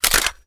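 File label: submachine gun reload mag out; reloads; iw8; gmod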